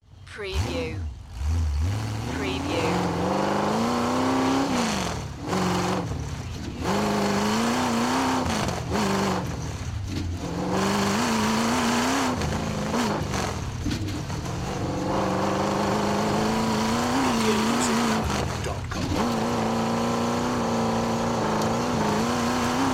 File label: Chevy V8 Hot Rod Long Sound Engine Fast Driving Around